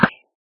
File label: cast fast, bobber; Minecraft